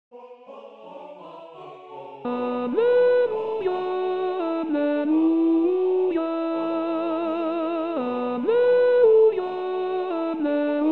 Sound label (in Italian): Alleluia Donne; anno liturgico